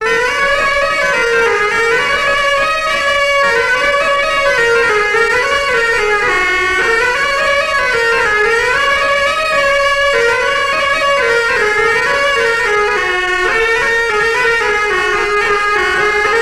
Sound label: alto